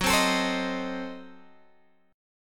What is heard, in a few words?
Gb Diminished 7th Guitar Open F; Gbdim7; strummed; 18,19,21,19,18,x